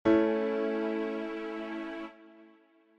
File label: A triad chords